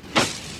Scythe winnows wheat; JERRY APPS WINTER

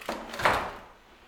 Loom Antique Loom In Use Weaving; Electa Quinney

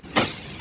Scythe winnows wheat; JERRY APPS WINTER

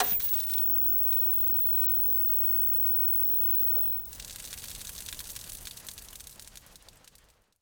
TV remote off on crackle; Poetry Project

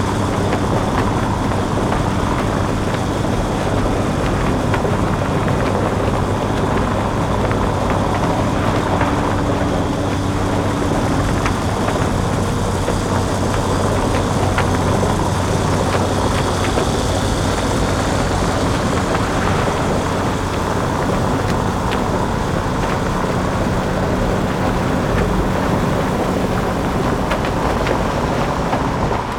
Car Road Noise; Poetry Project

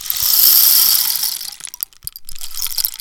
African Rain Stick Pou

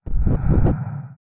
Swipes; Electa Quinney

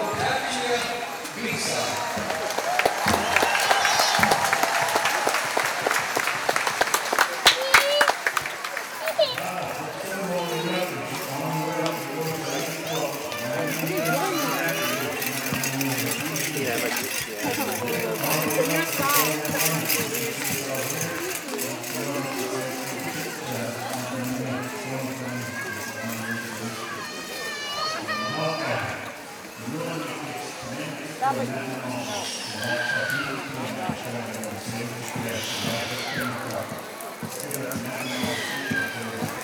PowWow Crowd Applause Greeting; Menominee

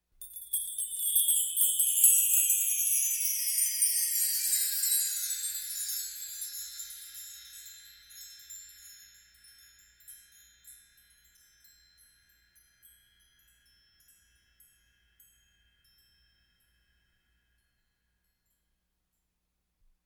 Wind Chimes Orchestral; Electa Quinney

